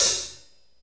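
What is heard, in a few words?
hi-hat, Techno Rock; Northstar; Drumscapes Roland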